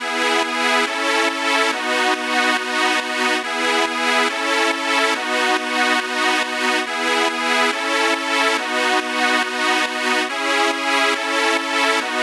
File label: Synth Sidechain Pad, 140 BPM; Vengeance Samples; Vocal-Hook Kits; Song Kit